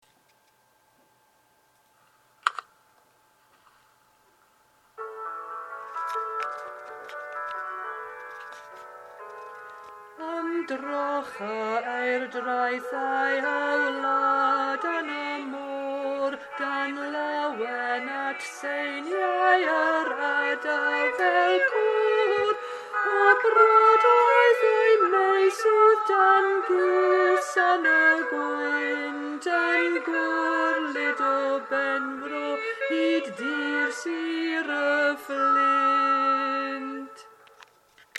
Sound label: duet alto verse